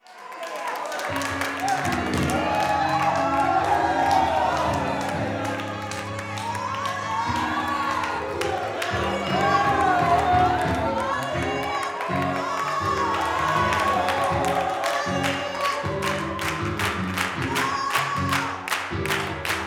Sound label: GO 2 CHURCH, Gospel; Spectrasonics; Vocal Planet